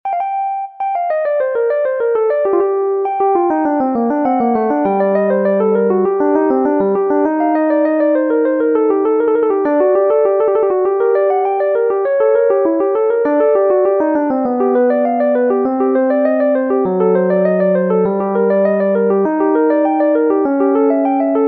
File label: toccata for mini keyboard